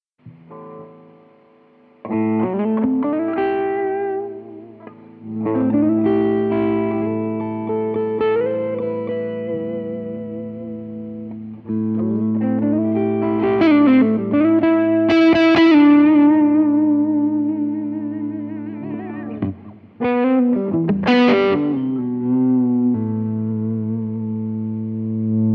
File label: Fuzz Solo 1x12